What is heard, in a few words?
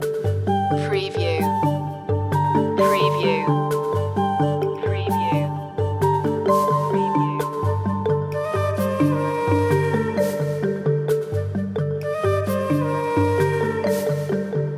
marimba mood loop; Ambient / Rhythmic Textures